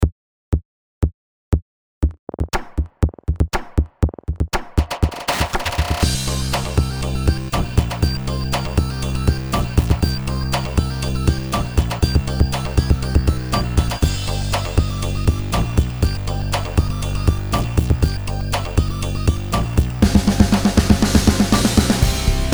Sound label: instrumental